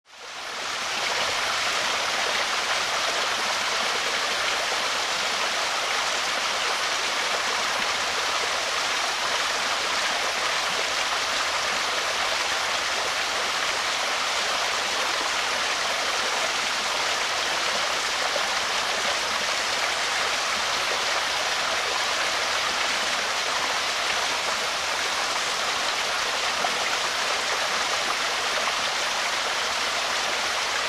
Small waterfall; river

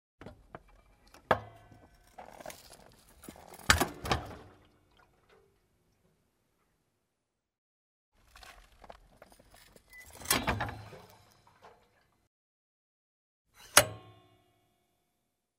Motorcycle is put on center stand

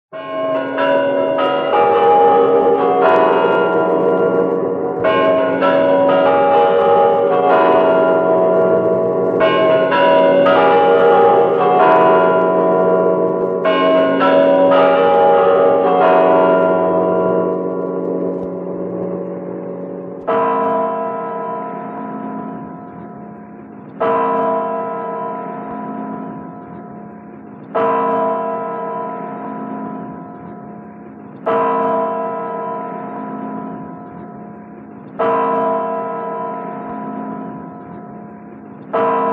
Kremlin chimes; watches

